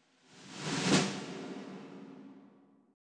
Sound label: orbit snare; Sky Children of the Light gamerip